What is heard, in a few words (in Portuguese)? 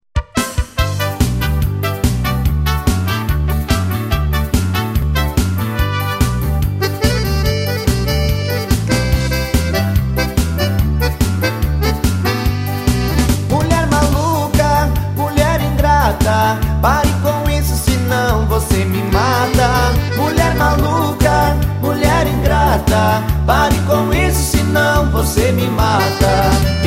sertanejo